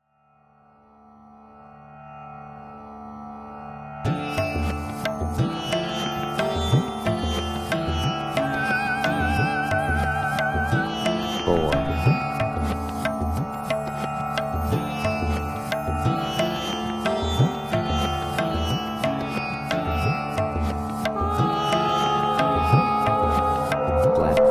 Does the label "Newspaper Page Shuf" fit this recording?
No